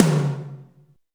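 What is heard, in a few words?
tom, real mega drums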